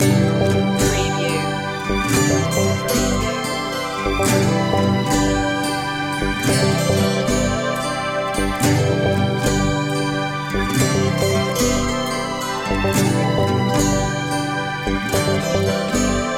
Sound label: acoustica loop